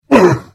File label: Hit; The Elder Scrolls III - Morrowind